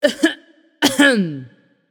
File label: nv clear throat; smite; voicepack; morgan le fay